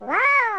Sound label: wooh; yoshi